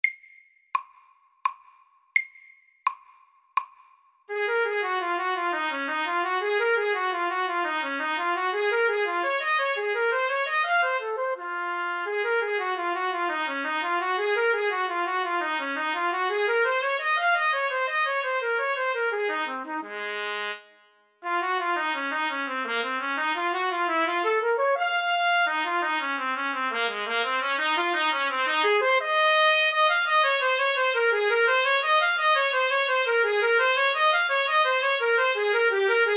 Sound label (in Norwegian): Etyde i Ess dur MIDDELS; Bohme